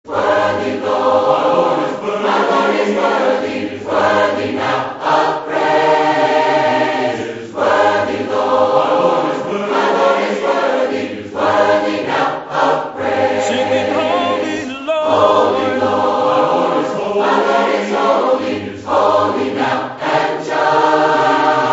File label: a capella hymn